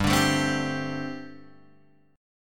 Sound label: G Suspended 2nd Suspended 4th G, Guitar, Open G